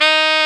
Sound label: SAX XSAX, Saxophones; All Saxes